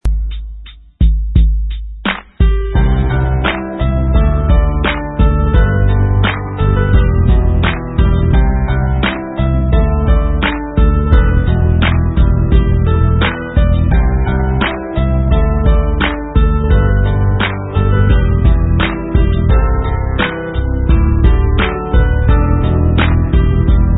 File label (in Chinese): 纯音乐